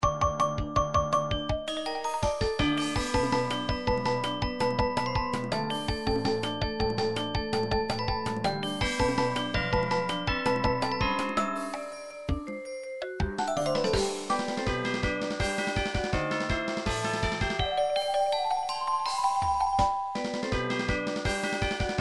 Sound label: title screen percussion remix; Game System Super Nintendo; Super Mario World